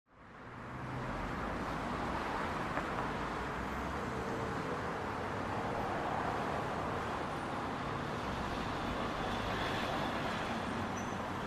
environment

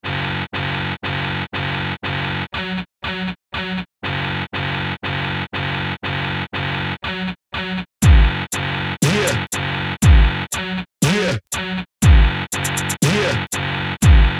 arp

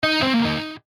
game over

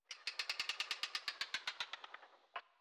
spin; hotline

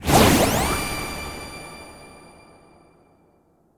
wild Move; berry burst

